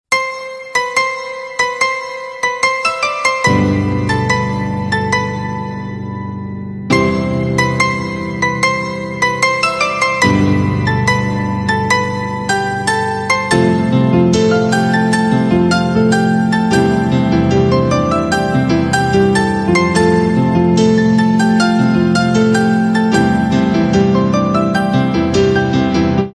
Piano Ringtone

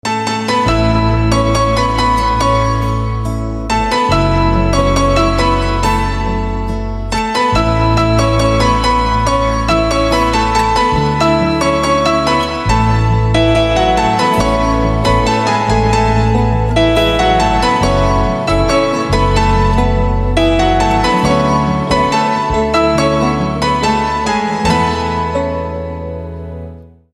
Piano Instrumental Ringtone

